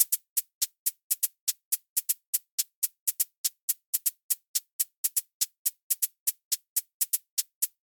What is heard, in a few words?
Hat Hi Hat, 122 BPM; mmb drum arsenal vol 1; Hi Hat Master